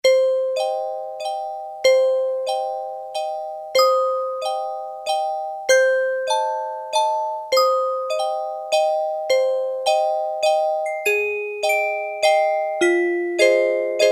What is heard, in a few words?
Celesta, Roland D-110; Factory Patches